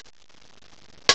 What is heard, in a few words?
HH CLOSE, CYMBALS; M8; CMI Factory